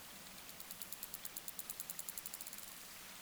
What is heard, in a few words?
BUZZ